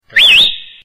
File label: Whistle Here